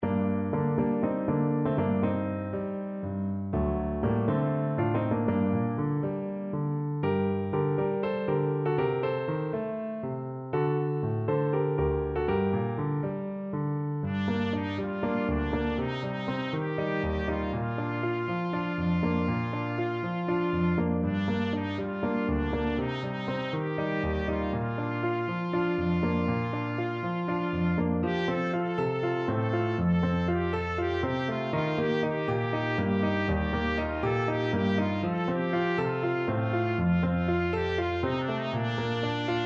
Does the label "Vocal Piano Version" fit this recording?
No